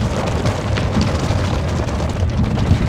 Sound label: Auto Tires Rock Dirt Loop; Automobiles